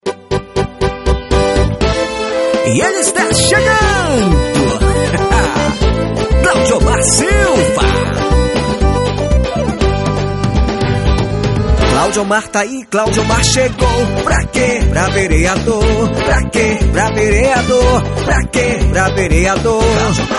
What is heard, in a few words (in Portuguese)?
Jingle politico